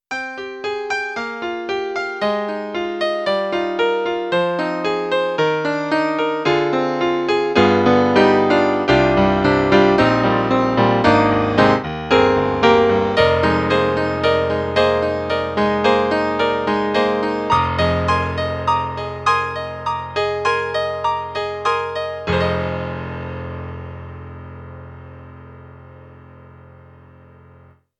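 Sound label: Acoustic Grand; E-MU; CT1900 Wave Blaster; SoundEngine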